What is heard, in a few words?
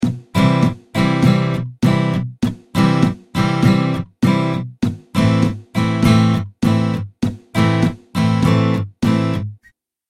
strumming pattern